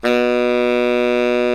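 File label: Tenor Sax C#2; TSX TENR SAX; Giga Samples Collection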